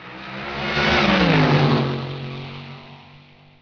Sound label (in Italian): rombo; rumori